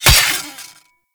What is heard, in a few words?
Melee Axe Impact Robotic Flesh; Axes